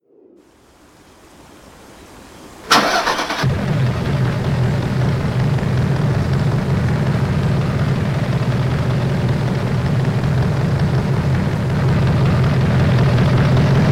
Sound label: spyker c12 zagato; 2008; TDU-1